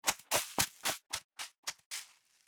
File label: run sand